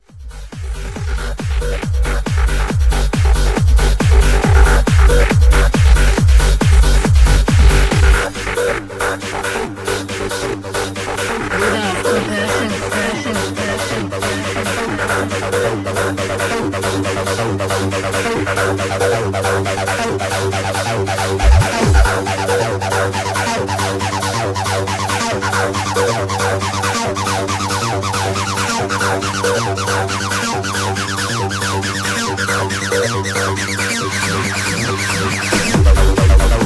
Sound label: Karma Trance